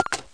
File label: Enabled Typing; VoiceOver; VoiceOver Sounds